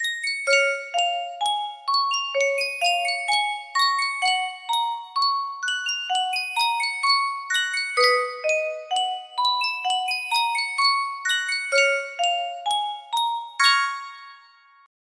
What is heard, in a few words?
music box, music box melody